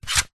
vehicles bicycle helmet pick up from ground; zapsplat

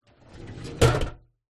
office metal drawer open; zapsplat

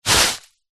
household beanbag small sit on

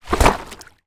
sport body board down in water; zapsplat

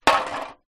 drink cans x2 empty down on concrete ground; foley

